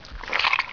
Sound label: Seaweed Squish; Victor Vector & Yondo, The - The Cyberplasm Formula